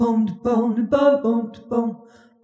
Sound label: Jazzy Harmony